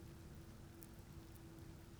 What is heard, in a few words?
pulses, BALAENOPTERA; MONACO 2022-07